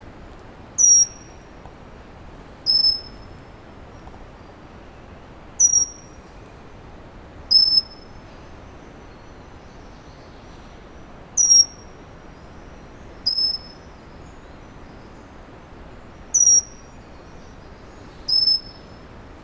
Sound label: wren babbler pygmy Pnoepyga pusilla